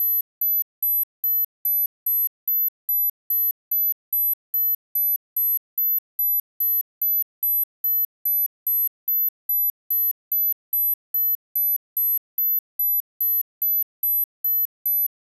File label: Watch Alarm Digital; Clocks, Watches and Timers; Blastwave